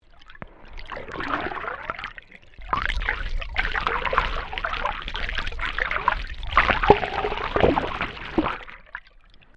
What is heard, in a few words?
Water Movement Through Water, recorded in a domestic pool